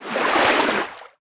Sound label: creature trivalve down to up